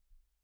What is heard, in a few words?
Kick In